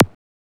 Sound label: Perc Laser Hard; SSO Percs